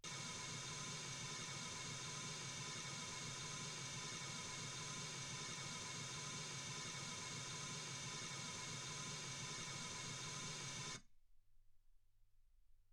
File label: mls 48kHz